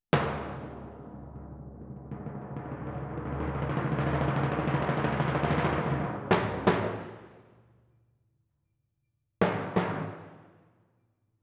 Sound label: Twin F Timp